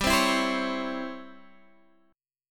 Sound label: C sharp Minor Major 7th Flat 5th G, chord